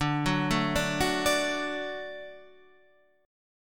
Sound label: D Major D down Guitar Half Step Down